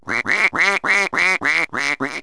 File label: quack, animals; Wired for Sound Pro CD; Aristosoft, Inc.